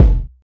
good steel kick drum sample f key, Royality free bass drum one shot tuned to the F note; kick drum samples